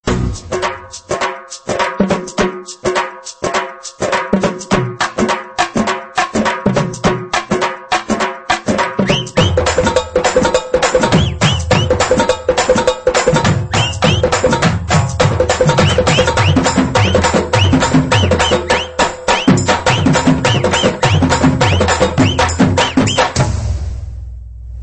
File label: Teenmaar Fast Beat